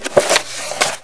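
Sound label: book open; The Elder Scrolls III - Morrowind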